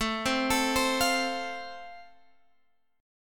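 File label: A Minor Sharp 5th, Guitar Open G, chord strum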